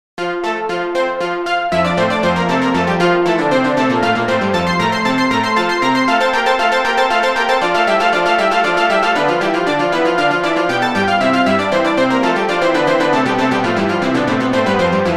multiwave lead, synthlead; Bach Inventio 8; BWV779

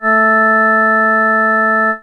Ganymede Organ Delta 220Hz

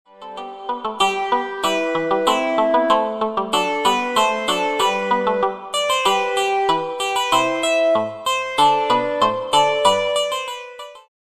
Jaw, synth lead; mozart

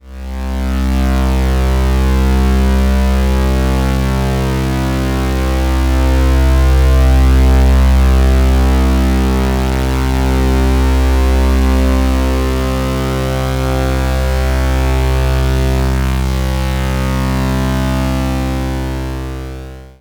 Shepard PW mid; experimental

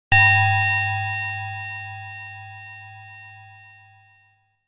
Grandfather's Clock

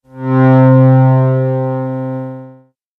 Triton, synth lead

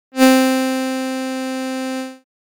Violin B, synthlead

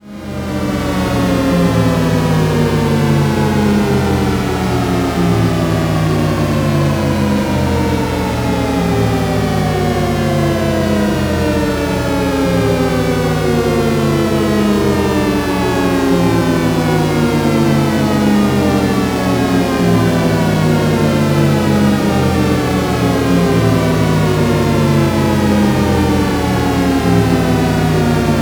Shepard falling mid Pulse Rev; experimental

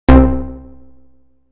Three Pulses, synthlead

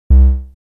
Tristar, synth lead

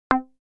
Shorty, synth lead

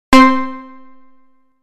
Three Pulses, synthlead

